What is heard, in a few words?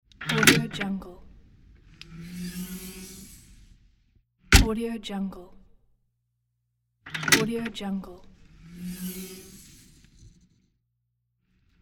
car trunk